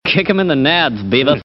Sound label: kick